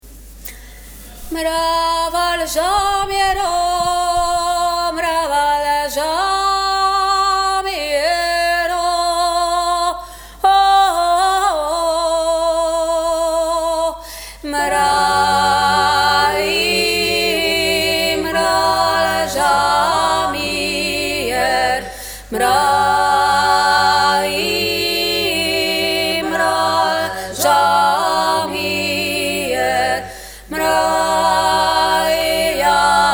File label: Tutti; Mravaljamier